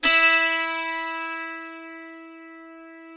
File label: E4, DULCIMER; SoundBytes; Early Patches